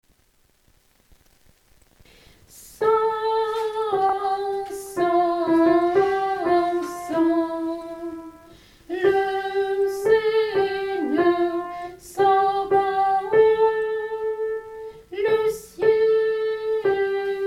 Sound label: Sanctus soprano